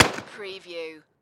Pistol 45 Magnum

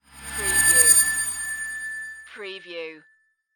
Swell Ring

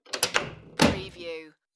Wooden Door Close; Sounds of doors and windows opening and closing, keys locking / unlocking doors

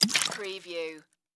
Bottle Water Half Empty; sound-effects-bible

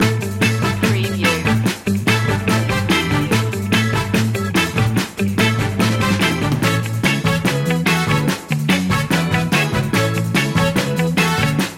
give me one time loop

